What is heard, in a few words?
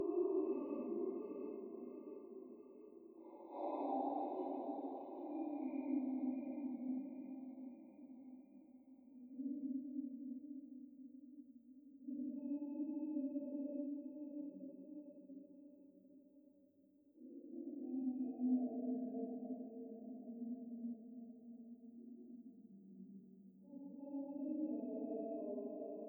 Ambience; VolFx; ScreenFx